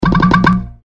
tongue; hl2dm; oppressive territory

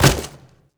pig attack hit; A Machine for Pigs

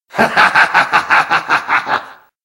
laugh; skulls

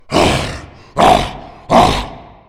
tank voice, zombie; Left 4 Fortress

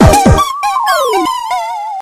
failure; tf; microtf2; orbulon

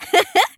tracer laugh; tf

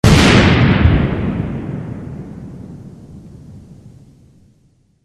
synth rifle

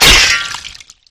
beartrap catch

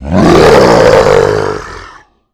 melee; agrunt

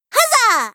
battlecry; hat kid; a hat in time

